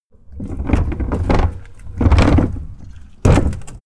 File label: garbage can roll